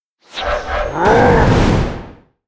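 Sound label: lesserking attack, monster; Senillinea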